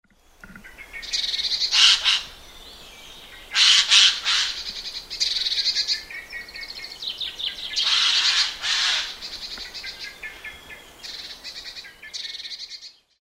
jay

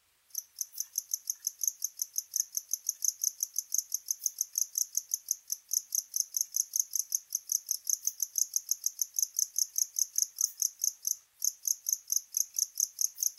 tropical house cricket

